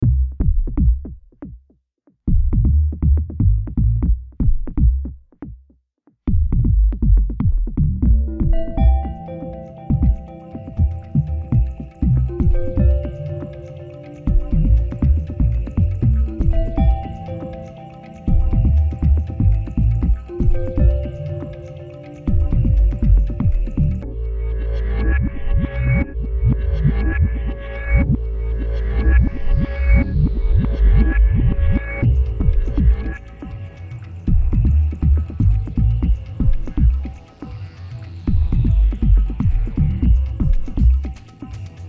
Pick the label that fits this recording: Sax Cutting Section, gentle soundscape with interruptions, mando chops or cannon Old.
gentle soundscape with interruptions